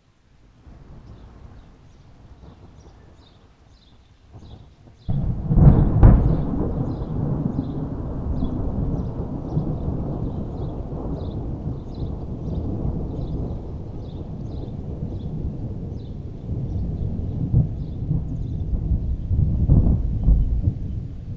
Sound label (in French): Orage